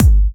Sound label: Hard Kicks; Vengeance Samples